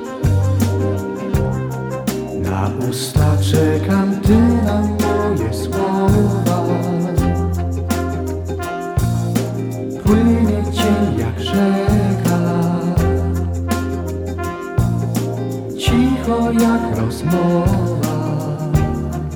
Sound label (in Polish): jazz fantazja; jazz 70